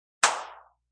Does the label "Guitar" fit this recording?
No